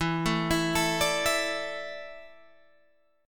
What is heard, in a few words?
D Suspended 2nd E, chord